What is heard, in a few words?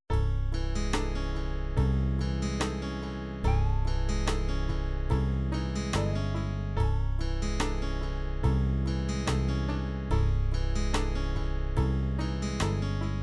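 country ballad, midi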